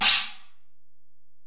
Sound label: Ride, Alesis DM-PRO, Drum Machines